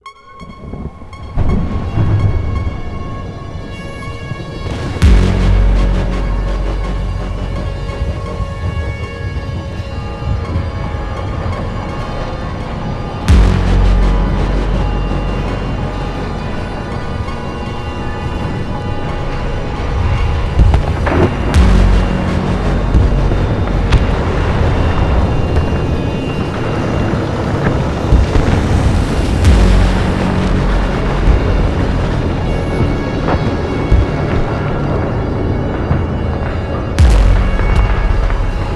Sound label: Naval Music Loading; Battlefield 4